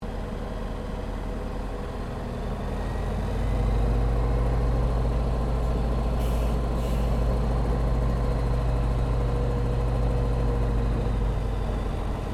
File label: Aircraft Yakovlev Yak-52 Taxi Interior, Russian Yak52 Training aircraft